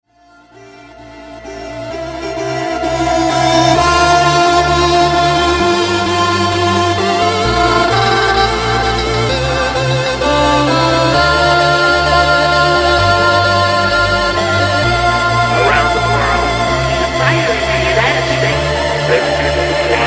Mashup

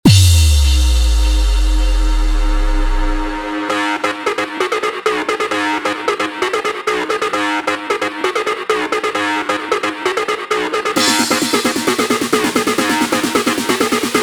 intro outro 132 bpm

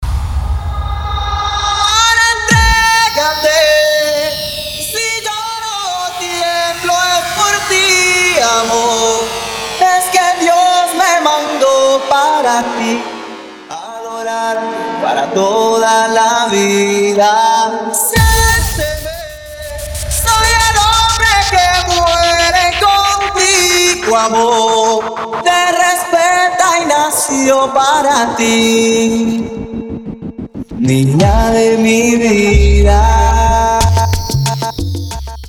Acapella 92BPM; Acapella Starter

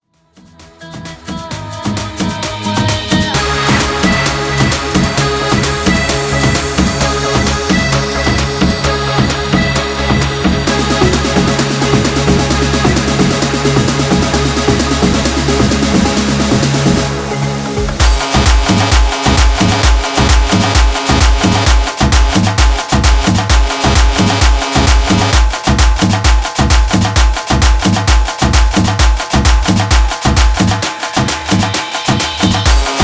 tribal remix 131 bpm

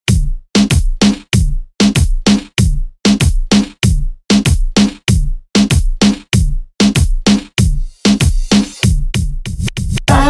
remix bpm 96; Prodeejay Remix

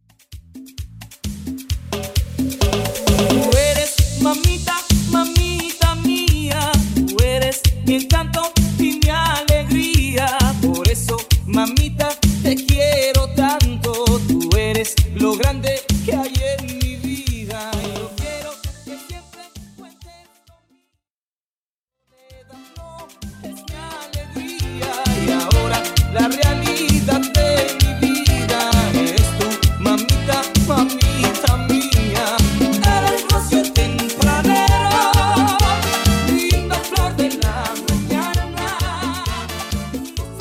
Intro 131 BPM

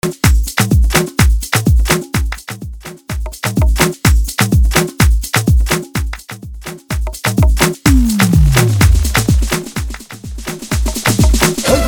afro house reedit 126bpm

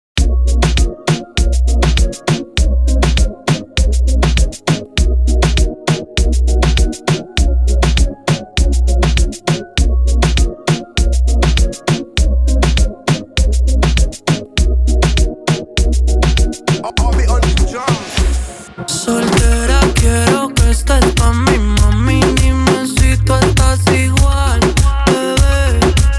Intro Outro 100 BPM

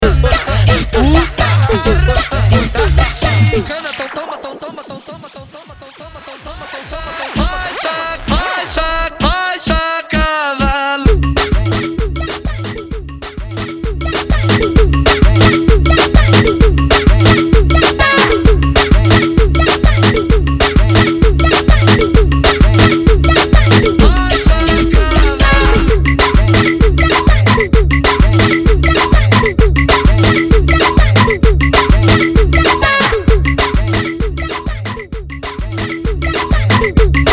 Bootleg 130BPM

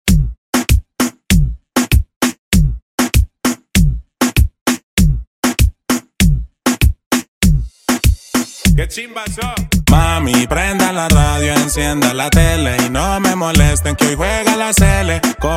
Intro 98 BPM

